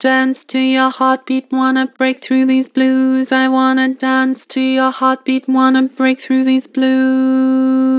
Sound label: Dance to your heartbeat, Vocals; Harmor